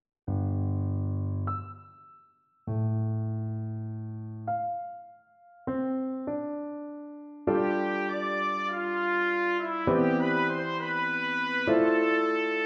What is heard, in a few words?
trumpet